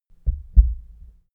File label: heart beat double calm; comedy-cartoon; koerpergeraeusche